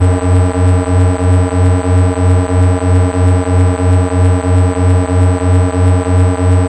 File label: deep